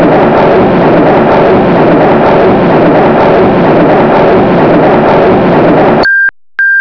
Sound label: trains